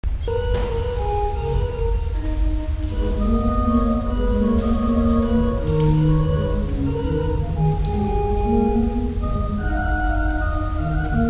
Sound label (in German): Orgel